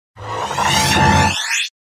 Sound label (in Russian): perehod kiberpank